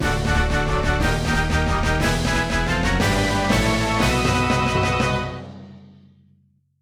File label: Congratulations!